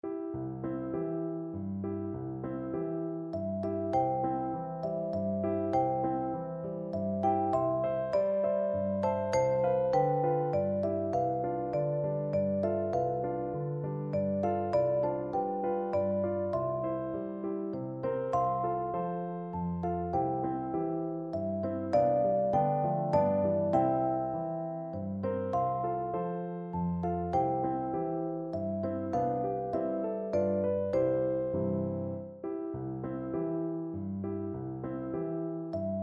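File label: lullaby vibraphone, percussion; brahms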